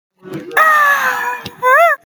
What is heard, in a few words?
moan